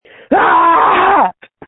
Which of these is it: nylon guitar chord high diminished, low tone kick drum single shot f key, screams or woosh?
screams